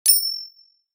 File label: Bell